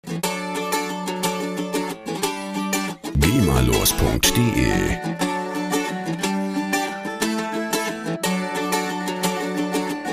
folk guitar

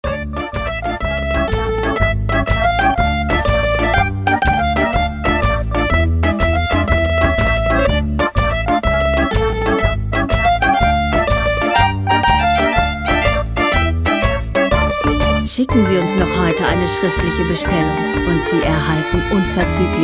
tarantella

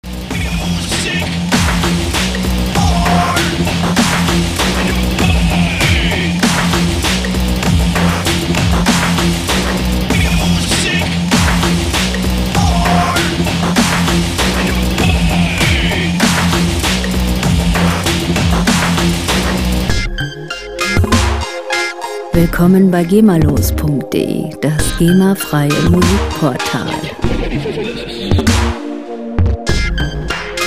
dubstep

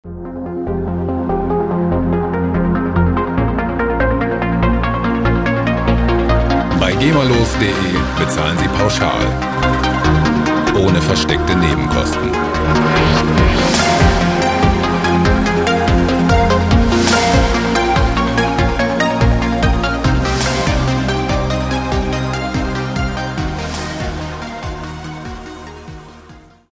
mysterious

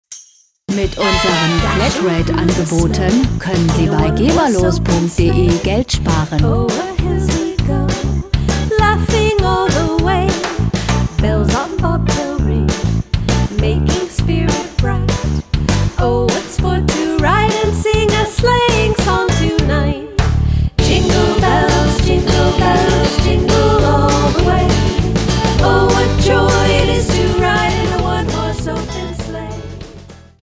rockabilly jingle

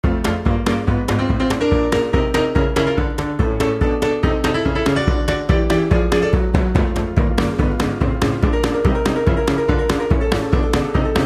7 oktaven boogie; lebhaft, erfrischend; Piano, Kontrabass, Schlagzeug

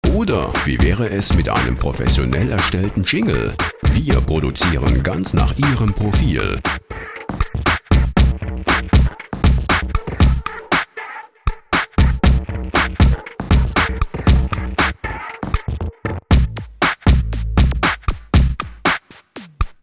rhythm c

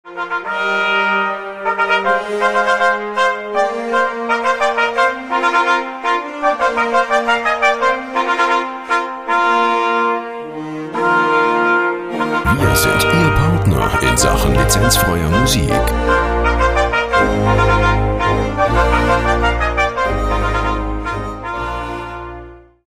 fanfare

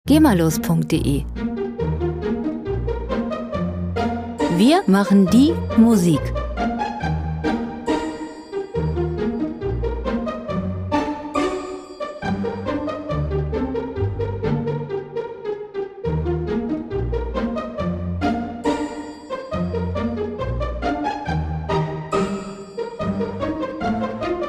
pizzicati

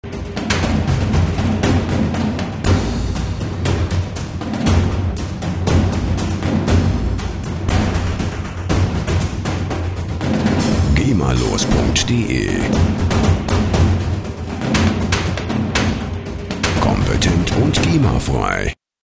cinematic drums

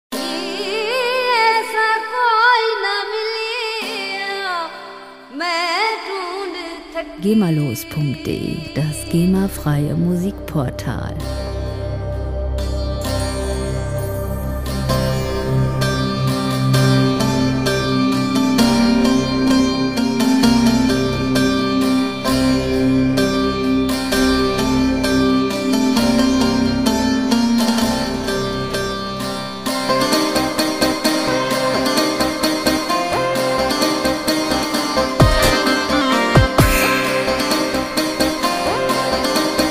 baglama

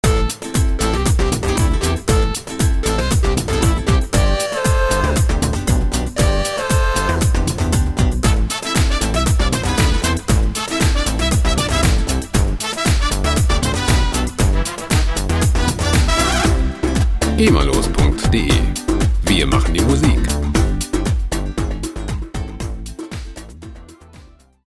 murga

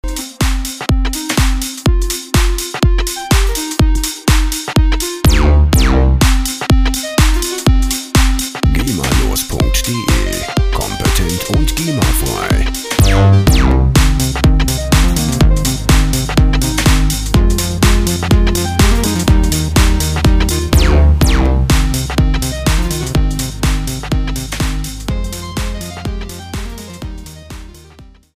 drumloop

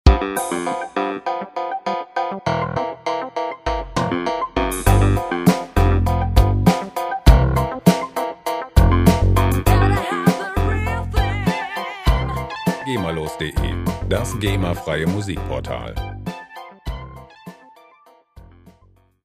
funky

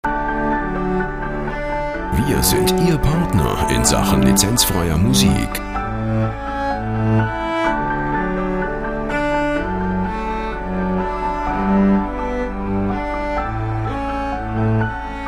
cello

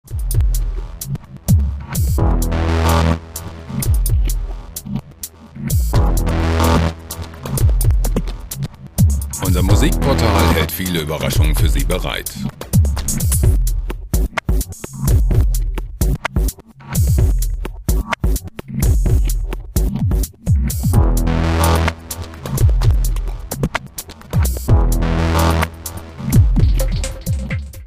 stack the beat

